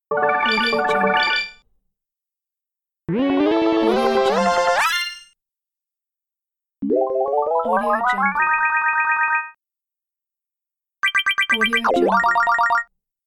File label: score happy